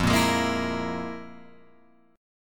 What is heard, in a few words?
F Minor Major 7th Double Flat 5th, Guitar, Open F; FmM7bb5 strummed; JGuitar